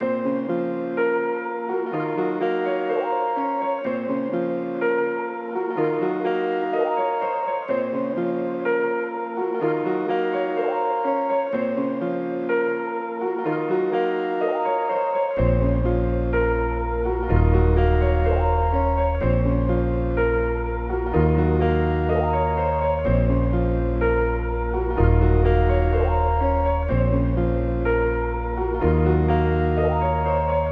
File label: 125 BPM A# Min, Melody, Trap; Cymatics; 2022 Melody Collection; Trap Pt 2